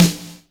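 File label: wet snare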